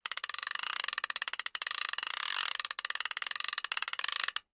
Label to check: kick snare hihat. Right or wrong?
Wrong